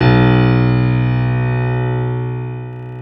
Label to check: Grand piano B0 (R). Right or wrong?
Right